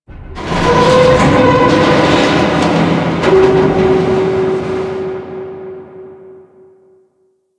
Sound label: Metal Groans; Ambience